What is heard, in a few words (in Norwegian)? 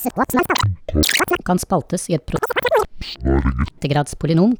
Skratsj